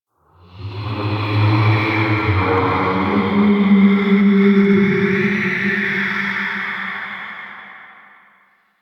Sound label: Ghostly Whoosh; Warframe; Man In The Wall